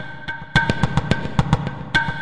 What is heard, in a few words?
108 indian percussion; indian percussion in palace